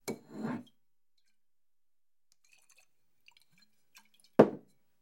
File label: Bottle Up And Down On Table; Grab Bottle From Table With Light Liquid Slosh And Set Down. - Bottle Set Down